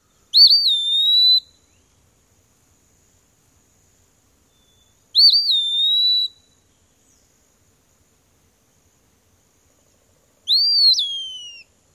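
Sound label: normal song, Eastern Wood-Pewee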